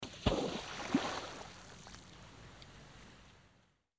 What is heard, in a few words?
pebble